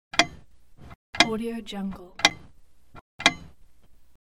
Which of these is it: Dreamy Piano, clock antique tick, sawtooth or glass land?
clock antique tick